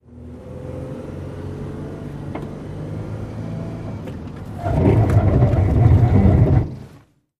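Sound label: SUV Truck 180 Rev Skid Interior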